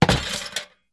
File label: Objects Fall Various